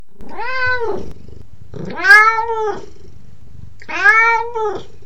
chat

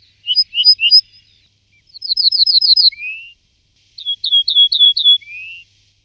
bruant

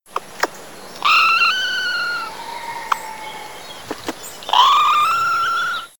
écureuil

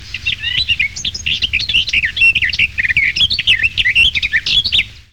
fauvette

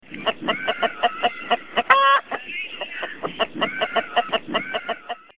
poule

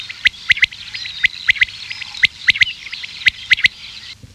caille

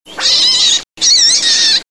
lapin

